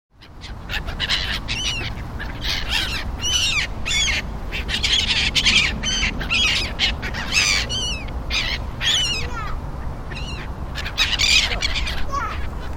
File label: seagulls and ducks feeding